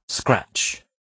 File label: scratch